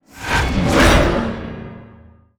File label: Crosshair Attention; netent